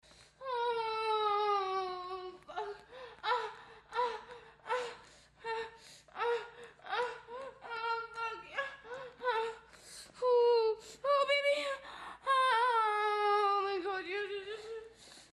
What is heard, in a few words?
sex, moans; The Witcher Hunt